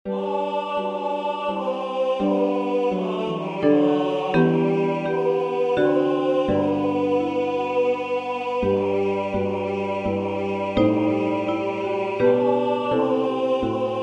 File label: Tenor